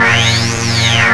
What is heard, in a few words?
item repair beam; Earth and Beyond; EA Games